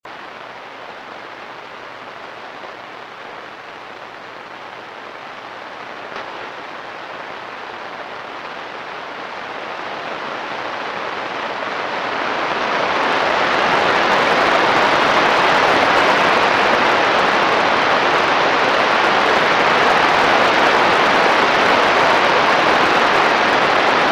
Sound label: radioburst